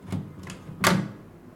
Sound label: door open